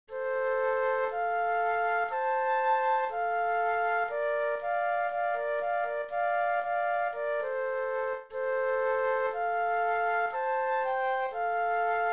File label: Flute Duet